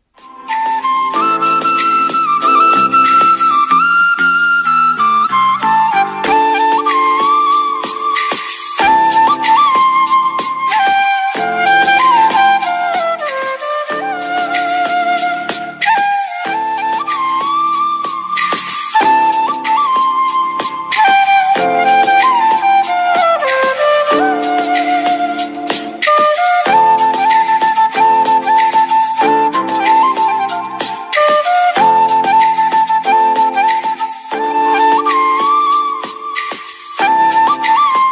Flute Instrumental

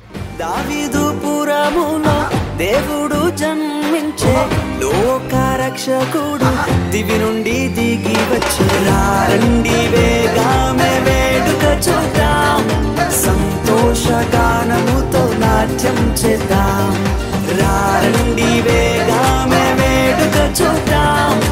Telugu Christmas

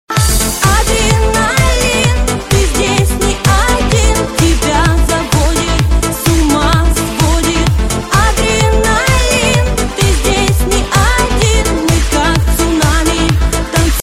ringtone

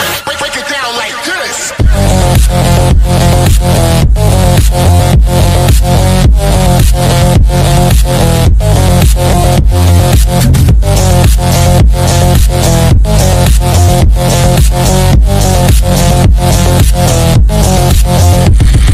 Background Music Tune; Fast And Furious 9; Android Mobile

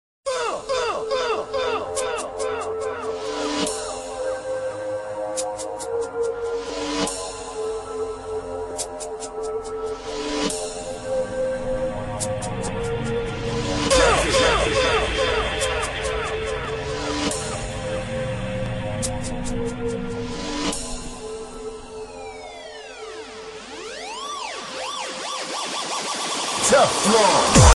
dubstep bass ringtone

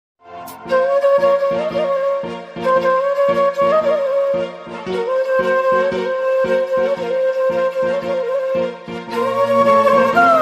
Instrumental Flute Ringtone

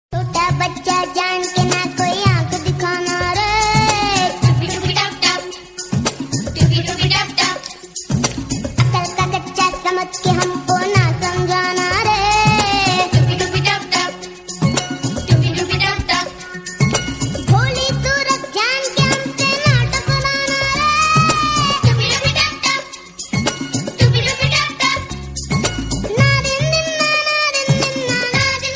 Hindi

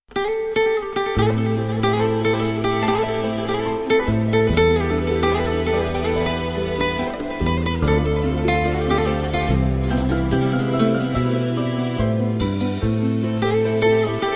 Guitar Tone Ringtone

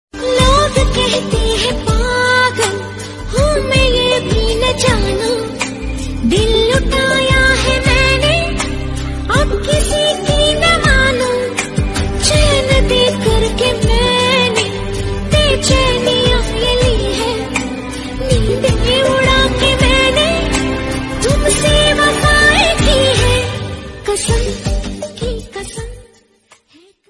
Female Version Ringtone

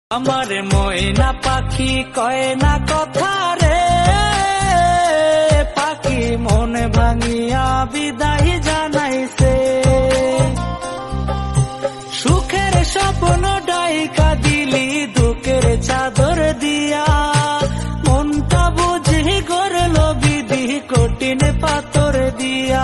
Bengali Song

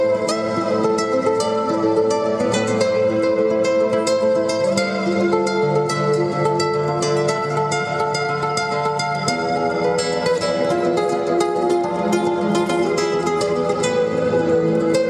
meditative guitar

